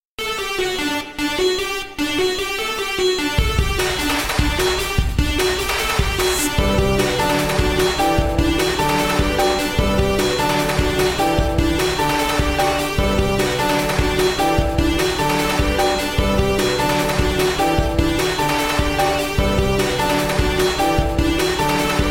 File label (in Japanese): ジングル 戦闘、緊迫 BPM150